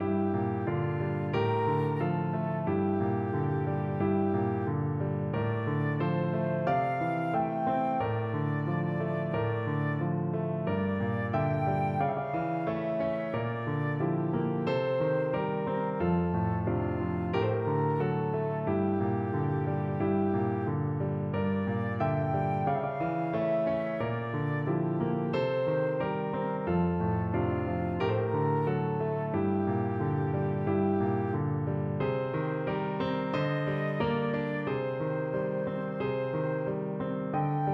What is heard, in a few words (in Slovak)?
paslo dievca pavy flauta; Krútňava; Eugena Suchoňa